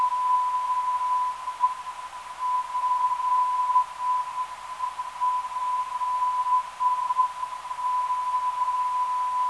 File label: 597.2kHz, ndb